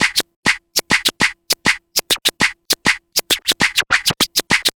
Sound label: Vinyl Scratch Clap